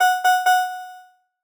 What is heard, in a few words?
mail jingle; steamworks; deltarune yellow translation